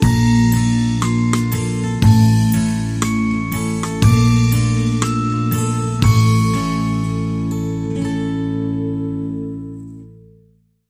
Sting Mysterious

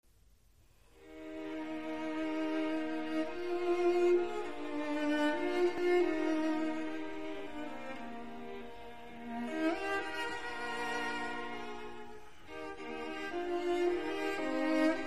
String Quartet in B flat major

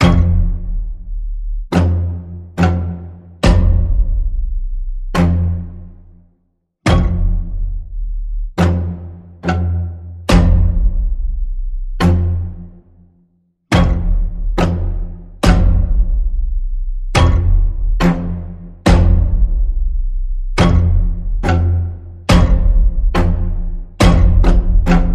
String Pulse F, Key of F

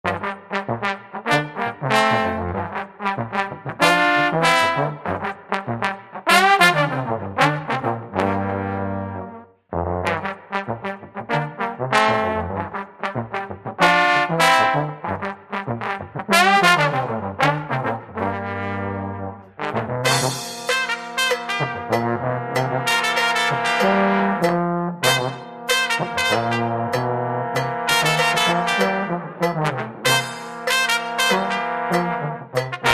Bavarian Funk, Brass Cowbell Version

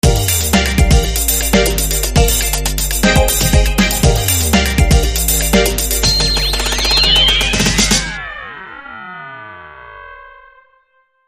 Break Beat

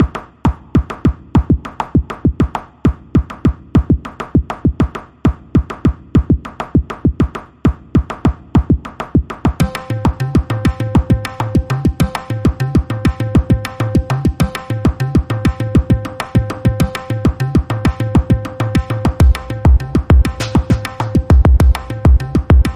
Percussion Only

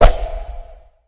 Quick Swing Whoosh

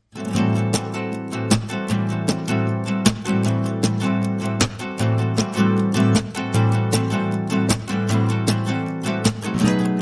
Rhythm Guitars Only

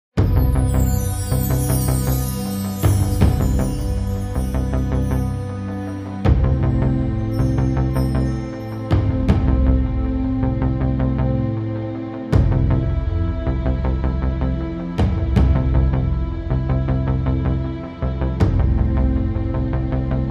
Drums and Bass

